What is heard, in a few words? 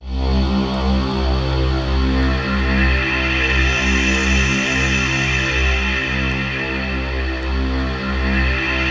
C2, Synth Leads; 90 s Sample CDs; NiceBeats (NiceBytes); Studioline 09